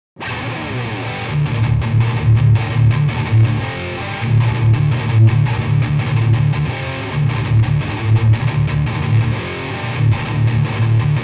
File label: Amp 3 Channel B